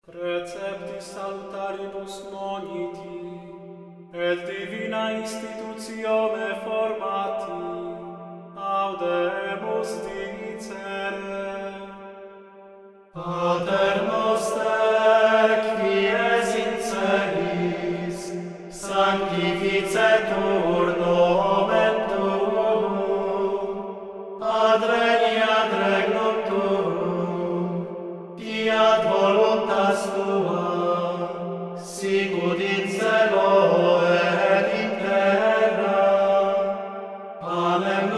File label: Schola Gregoriana Pater Noster